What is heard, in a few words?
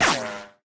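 ricochet, guns hits; Weapons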